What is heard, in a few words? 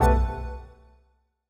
Chord; Longhorn 9X Sound Scheme by David Mounder